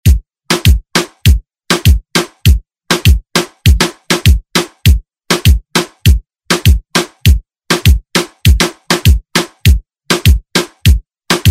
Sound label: moombahton redrum